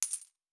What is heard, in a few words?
Coin; Fantasy Sounds Bundle